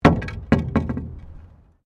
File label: Wood On Wood